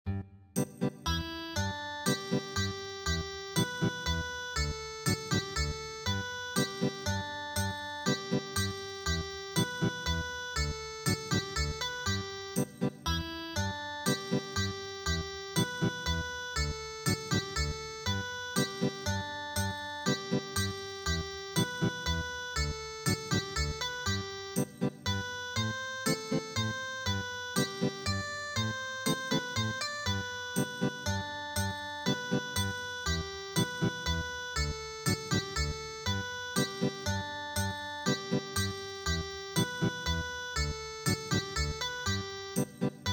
German Dance Song Ethnic Guitar

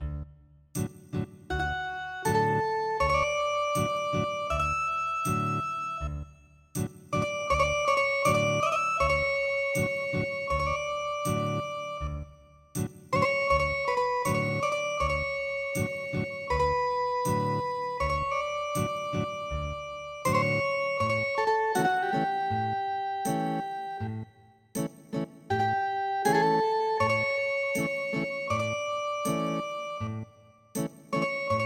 Ethnic Guitar

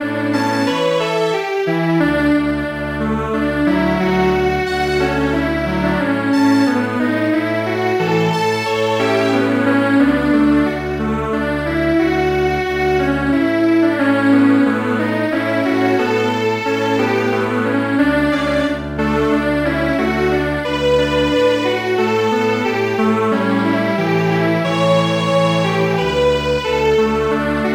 Strings Quartet; Abschied Von Den Bergen

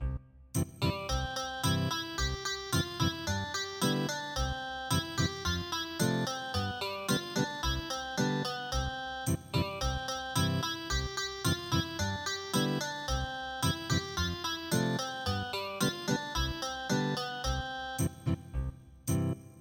ciranda cirandinha Ethnic Guitar

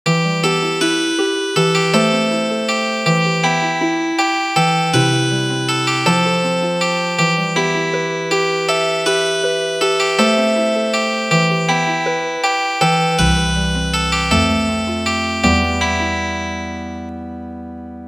Harp; Abu abu abua

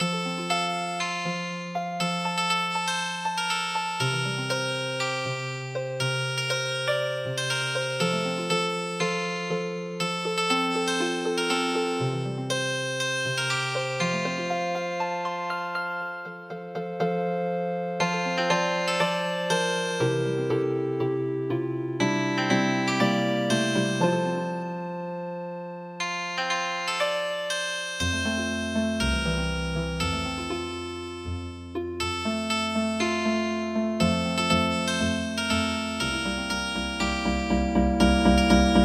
Healing Harp; Rock A My Soul

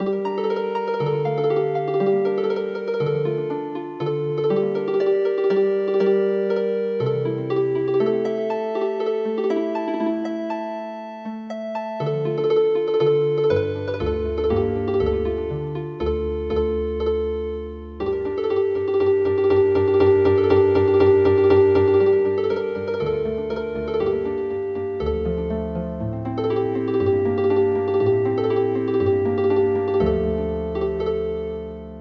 zuizui Healing Harp

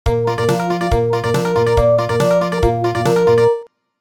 bugle Country; midi; traditional

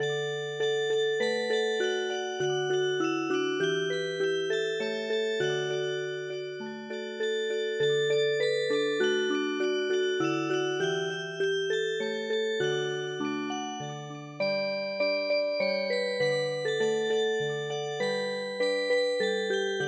Music Box, midi traditional

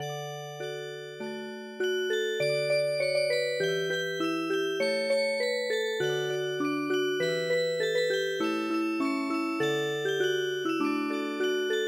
Music Box, traditional; Samara Town

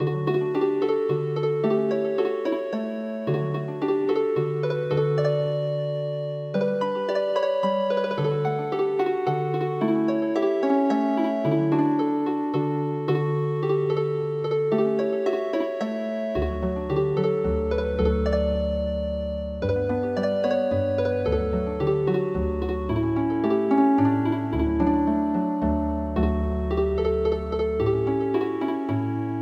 Healing Harp, traditional; The Alphabet Song

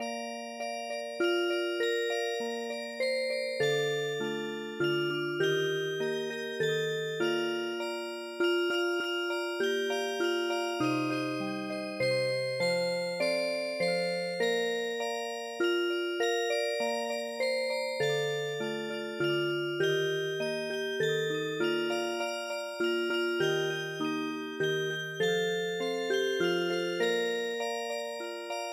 Music Box

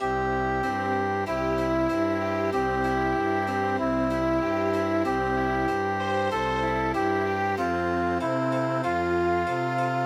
Chamber Music

